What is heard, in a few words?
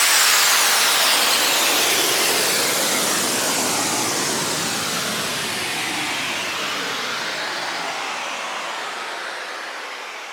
SFX Down, Dubstep